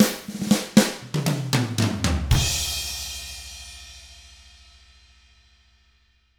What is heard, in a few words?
Drums, R N B 6-8; ILIO; Double Platinum Drums 1